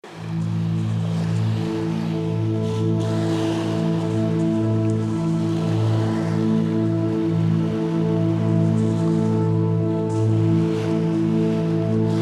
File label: noise of an empty bottle; Ehohroma; Caserma